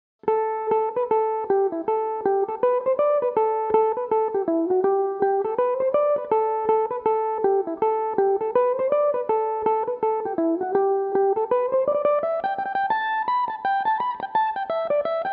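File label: reel A dorian, Irish mandolin; The Abbey Reel; Belmuse EM-190 electric mandolin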